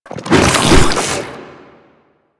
Infested Ancient Strike Flesh; Warframe

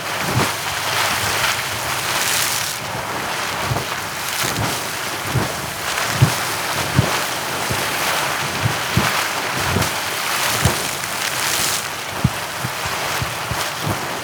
Aqua Board Water Splash Loop; Warframe; Yareli

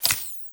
Pistol Laser Aim Exit; Lasrian

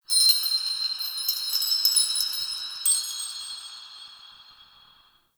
Metal Chimes Alt; Ambience; Warframe; Nokko Colony

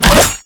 Spin Whoosh, Weapons; Infested; Coda Caustacyst

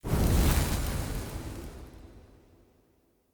Short Sword Fire Blade End; Weapons; Elemental

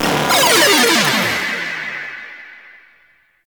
Arcade Fail; Warframe; Nineteeen Ninety Nine; Snake Game

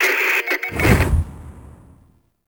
Super Weapon Call In; Warframe; Caliber Chicks Minigame; Weapons

